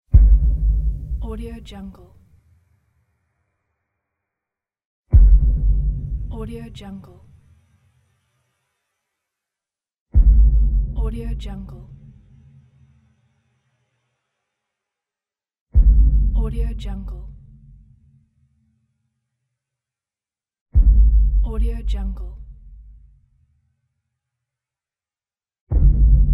boom

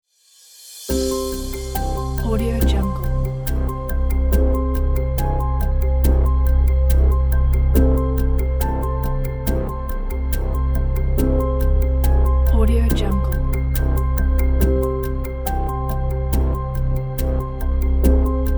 thinking time

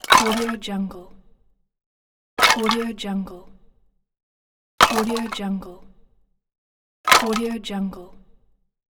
wood fall

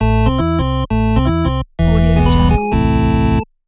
arcade game over

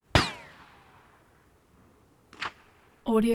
ricochet reverberant

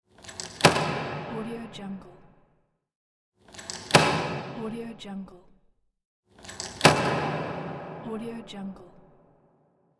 locked door reverberation

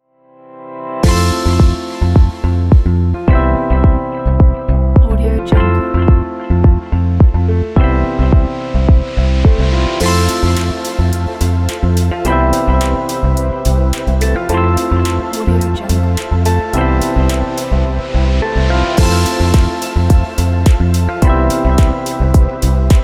fashion calm

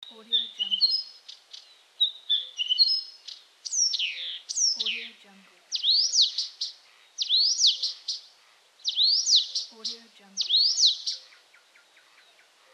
noise of night birds

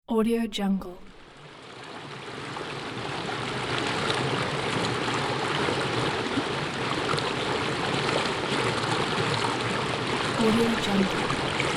soft river

